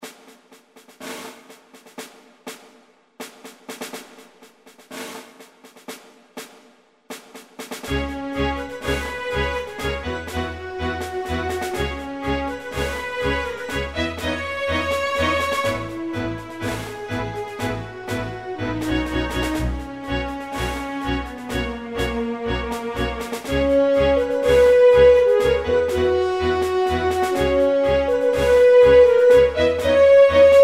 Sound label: Inspiring theme; Ashes of Immortality; RPG Maker